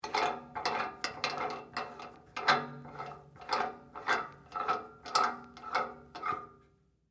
Spigot Close, Industry, Spigot, Close, Turn, Handle, Metal, Tank, Water, Heater; Laundry; BlastWave